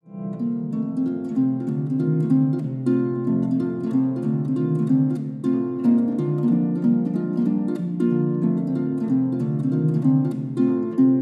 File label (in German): Boarischer, Harfe; Kohlgrub-Boarischer